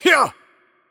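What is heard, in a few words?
Attack Heavy, Spanish; bloom minigame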